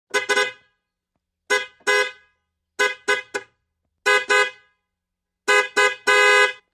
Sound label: Aston Martin Horn; ext. horns